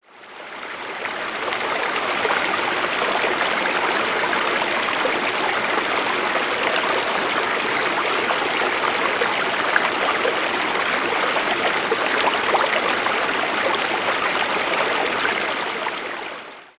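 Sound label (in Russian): горный ручей окружающая среда; film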